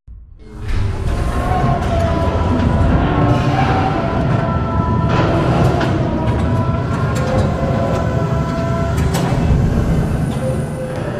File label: Struggle Move; Boomtown; BT Taken; Titanfall 2